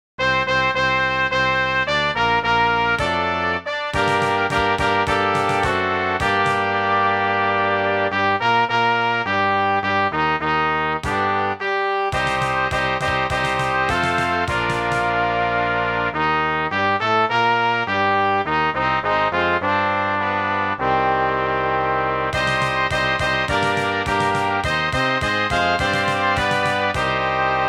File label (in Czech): Fanfary